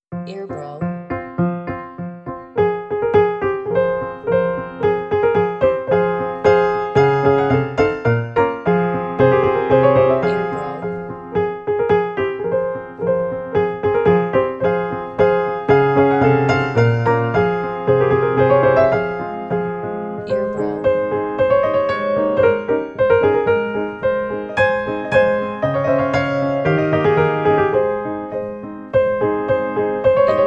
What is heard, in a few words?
Piano; Schubert D780 No3 in F minor